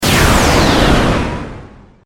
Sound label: Laser Burst Descend, Laser, Burst, Descend, Science Fiction; 5.1; BlastWave